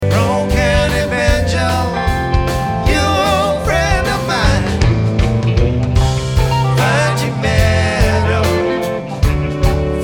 Banjo

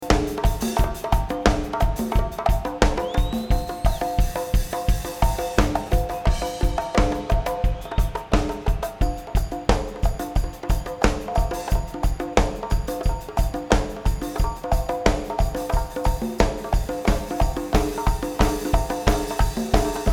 Drums

